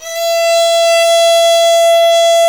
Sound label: VL TEN E 4-L, STRNGS